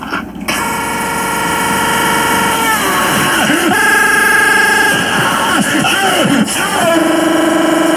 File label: ghost scream; gorilla tag; viral